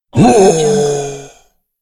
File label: zombie hit groan